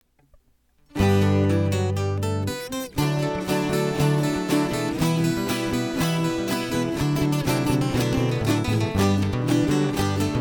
viola rasqueado; Rasquiado Cuiabano

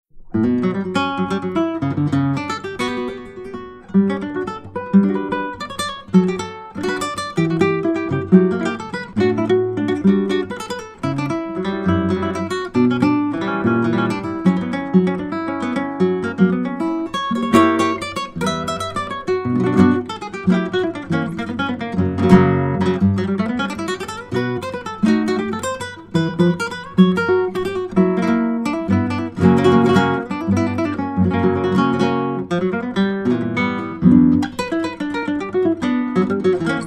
instrumental

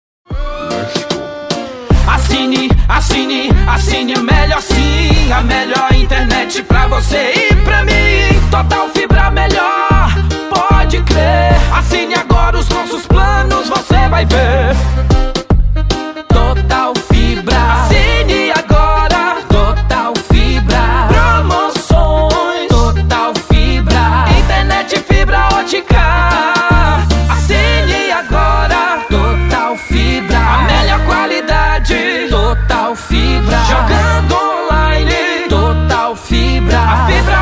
piseiro jingles; Tik Tok Total Fibra Internet; Musica Para Provedor De Internet; Jingles Politicos

